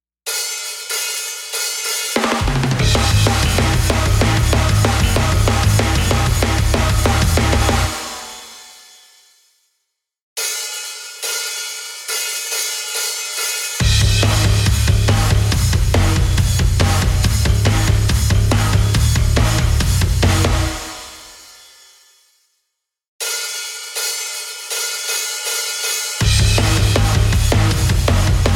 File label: karaoke